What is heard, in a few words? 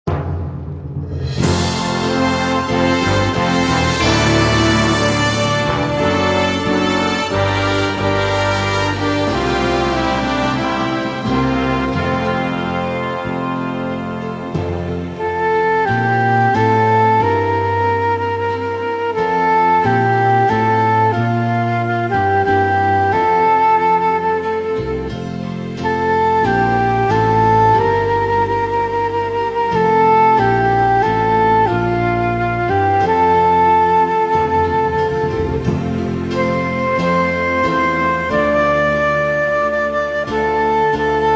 Instrumental Hymns